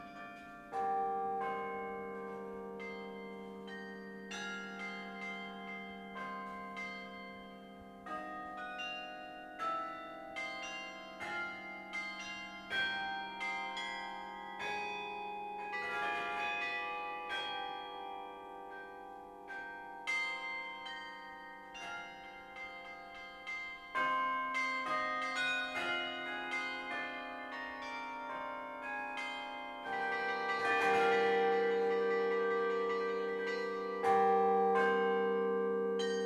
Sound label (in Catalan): campana